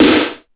Hip Hop, Drum Machines

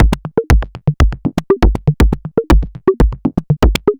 Anabeat Mix, Beats

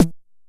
Bass Snare, Jomox Airbase99

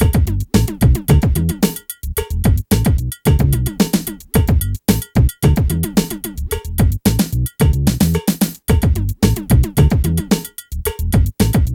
BEAT, Beats 105-150bpm; PlatinumPhattCD1; E-MU Producer Series Vol. 8 – Platinum Phatt

